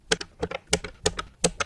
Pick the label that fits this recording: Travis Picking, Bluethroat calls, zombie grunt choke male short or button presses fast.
button presses fast